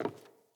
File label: Sandals Walk Wood; Player; Footsteps